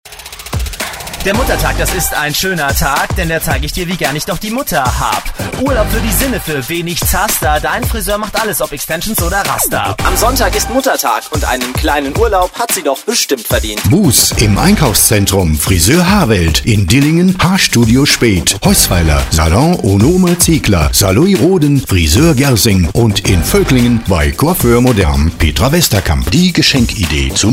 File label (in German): Muttertag Rap Grundspot